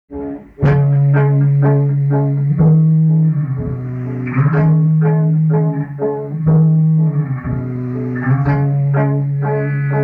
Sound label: home instrumental